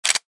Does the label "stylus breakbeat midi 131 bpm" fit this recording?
No